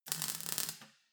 weld sizzle short

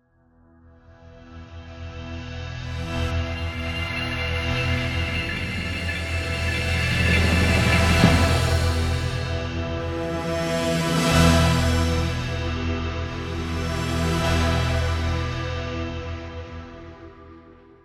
orchestral synthetic intro

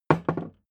hammer wood bounce

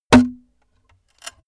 sword hit wood pullout

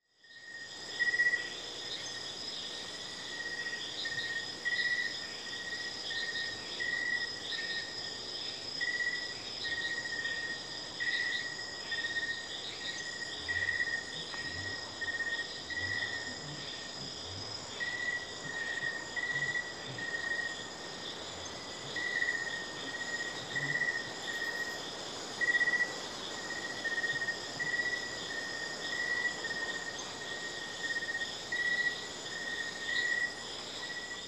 insects jungle atmo

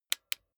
bicycle street gear control down

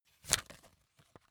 magazine flip back fast

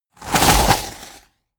whoosh flutter

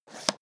leather latch open

